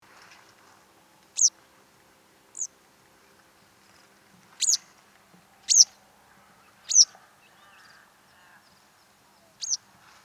Motacilla Tschutschensis; Eastern Yellow Wagtail; Call

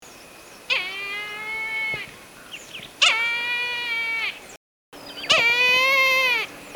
Haliastur Indus; Brahminy Kite; Call; Call 2

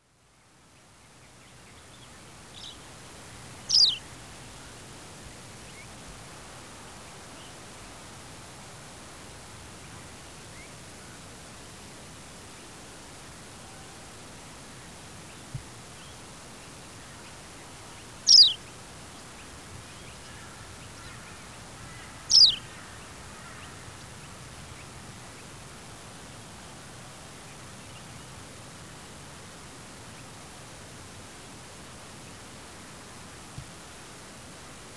Anthus Australis; Australian Pipit; Family - Motacillidae (Pipits and Wagtails); Call

